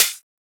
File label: Closed HH